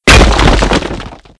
Smash rock; Playable Tutorial Level

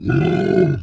Walk; TR3; Shiva; Murma

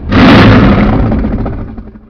Dragon Growl; Playable Tutorial Level; TR2

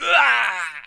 Security Guard Death; Playable Tutorial Level; TR3

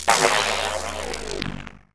Boss Shoot; Puna; Playable Tutorial Level; TR3

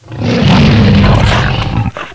T-Rex Death; Playable Tutorial Level; TR1

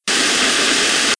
Tv Whitenoise Loop; Playable Tutorial Level

